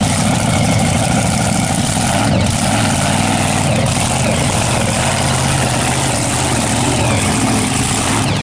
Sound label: Monster Truck; Learn to Fly 3